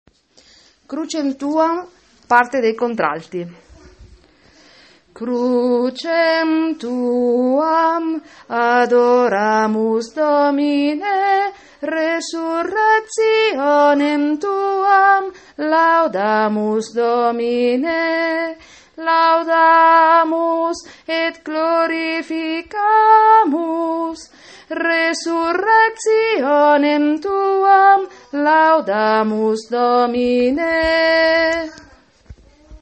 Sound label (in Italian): crucem tuam contralti